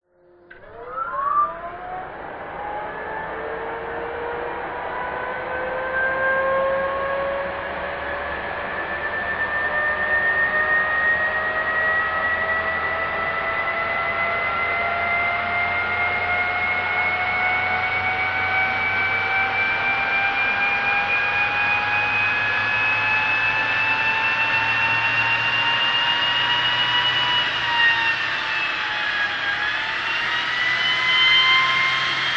science fiction spacecraft jet engine start up power up idle off ext; zapsplat